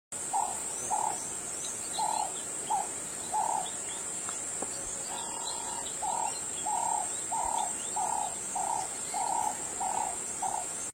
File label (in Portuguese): Canto do abetarda gigante